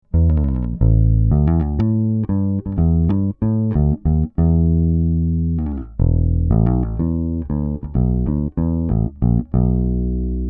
PRE 73 Bass DI; GAP

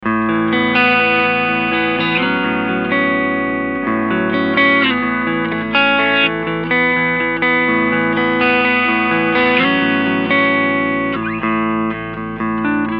Cleansound Midrange Scoop

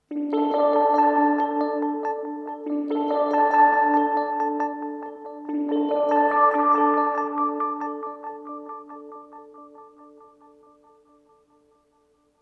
Echo Fix EF X2 Mode 2 min Speed

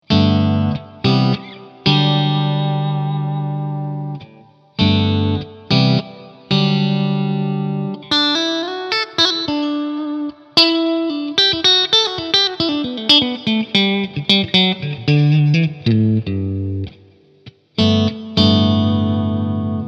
Clean EF86

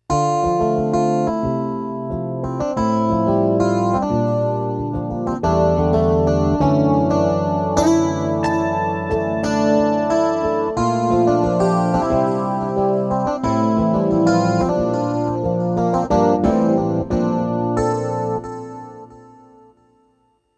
MODX Hybrid 1coil E Guit AWM FM

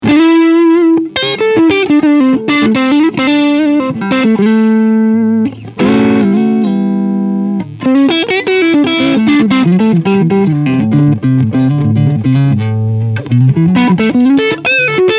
Crunch Neck Singlecoil

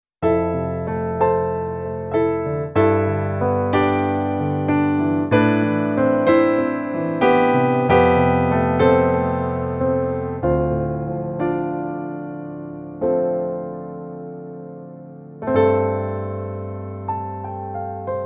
Yamaha P525 Warm Piano

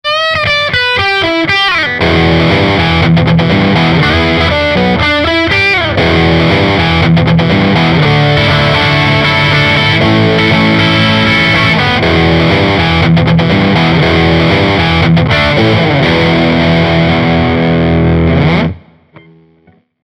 Bridge OD; Hagstrom D2H

